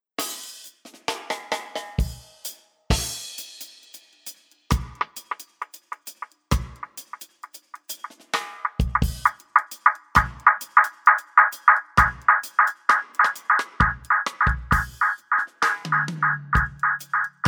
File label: Delay Feedback Analog OFF